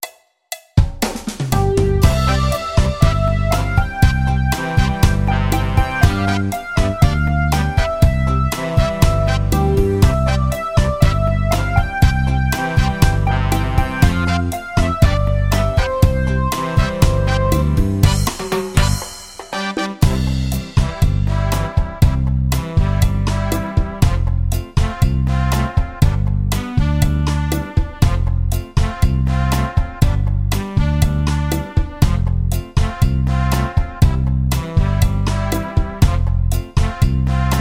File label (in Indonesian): Instrumen